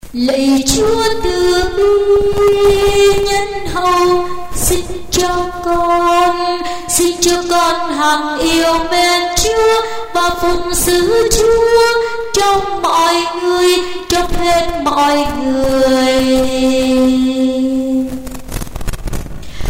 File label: alto basso